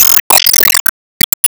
Enemy Train Signal On

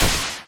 Swingpower Shot; disney star smash android ios mobile gamerip 2020

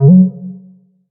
Shield Up; Cobalt Core

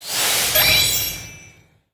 Serve Ball Build; gamerip

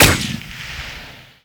Gun Shoot Fire; Skylanders Cloud Patrol; Arcade, iOS, Mobile

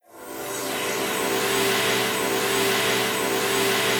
Enemy Healer Charge; disney star smash android ios mobile gamerip 2020

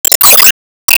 Woodblock Shot; gamerip

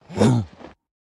Throw; Baron

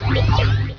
loud ammo; Virus: The Game

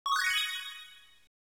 Coin; Print Club 2 '98 Autumn Ver

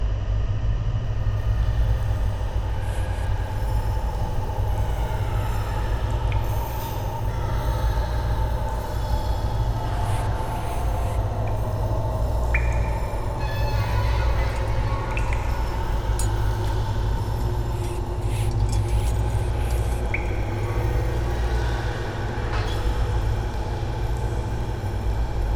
Prison Ambience; Prison Ambience1

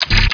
Radio Fade

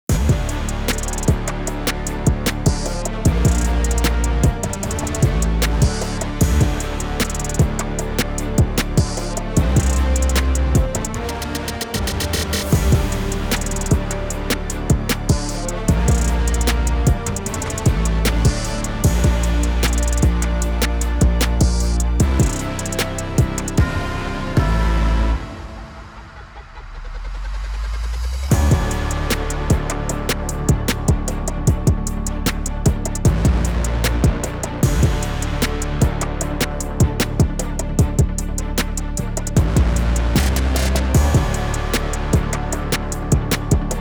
Back in Action Instrumental; UPM

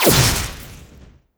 Enemy Dress Common Active Attack Shot

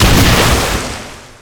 Enemy Coral Active Hit; disney star smash android ios mobile gamerip 2020

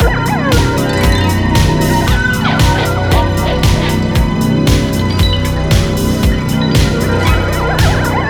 Spooky Breakdown Loop

